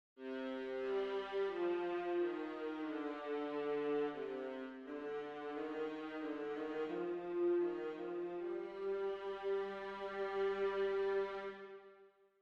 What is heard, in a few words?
viola section; sibelius sounds